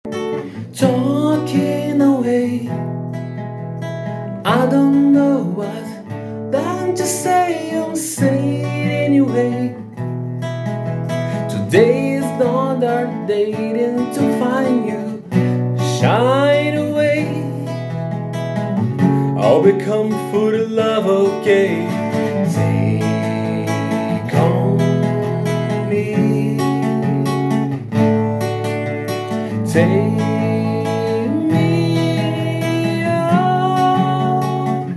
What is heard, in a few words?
acoustic